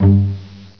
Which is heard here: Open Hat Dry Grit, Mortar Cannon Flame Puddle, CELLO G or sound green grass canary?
CELLO G